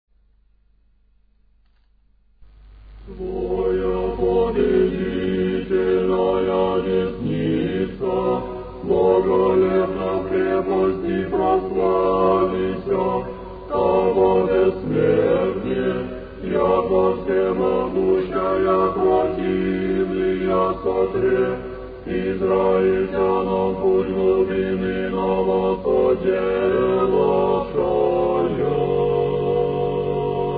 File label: irmos; octoechos